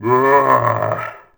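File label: zombi attack; creature; GammaAge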